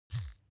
object household razor smooth single

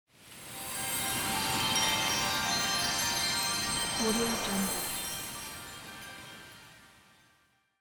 winter magic

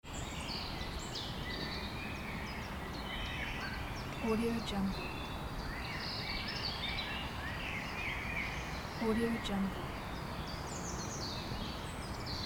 nature noise

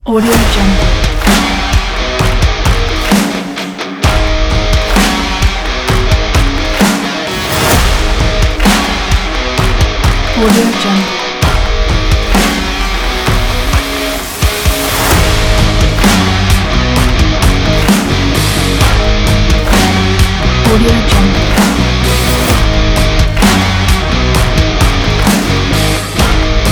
energetic action sport

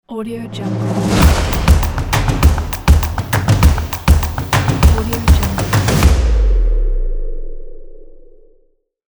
fast percussion intro logo

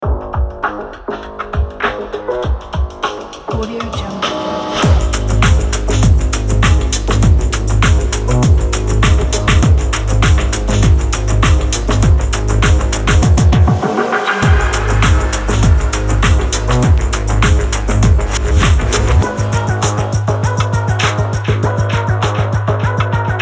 caves logo; boomopera-sfx

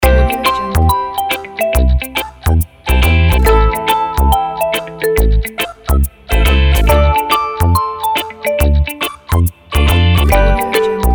casual game menu

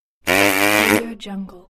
fart

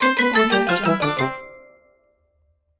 game over

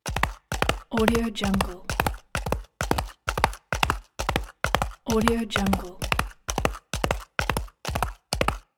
horse rides

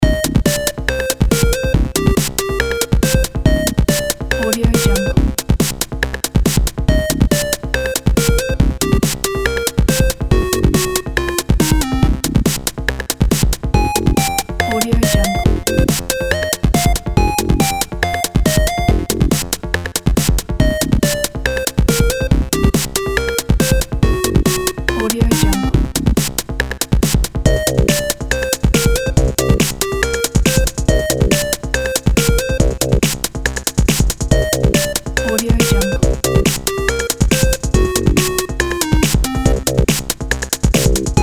8bit retro video game arcade; eitan epstein music

